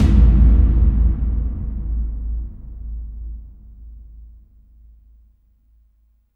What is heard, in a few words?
CAVERN TUB, Impacts, Hits & Booms; Distored Reality